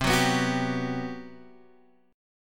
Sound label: G Major 7th, C; strummed; JGuitar